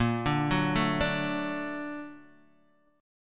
Bb Augmented Major 7th Bb down Guitar Open D

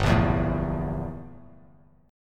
G Minor 6th Add 9th G down; Gm6add9 strummed; JGuitar

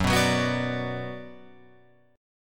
F sharp Suspended 4th Sharp 5th, Guitar, Open F; strummed; F#sus4#5

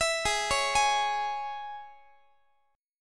Gsharp Suspended 4th Sharp 5th, Guitar; arpeggiated; x,x,19,18,17,19; 4A,4E,5A,5D,5Gsharp,6Csharp; G#sus4#5

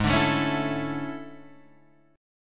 E 13th G sharp

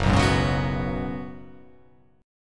F sharp Suspended 2nd Suspended 4th

